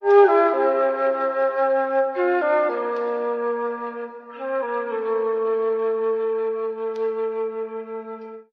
flute, Loops Phonky

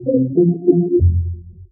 bass weak sauce

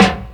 snare, Real

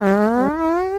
fart horn; Farts

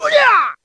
"ooya", voice; ooh ahh

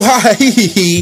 ha ha hee hee hee, laugh; ooh ahh

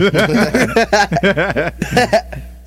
laugh two men; ooh ahh

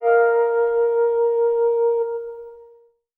flute synth; Notes; Winds

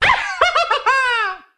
laugh clown; ooh ahh

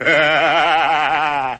laugh

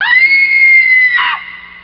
scream female; ooh ahh

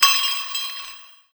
rattler, Bells

